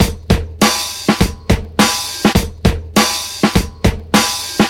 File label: 102 bpm drum loop d sharp key, Free drum groove - kick tuned to the D# note. Loudest frequency: 2211Hz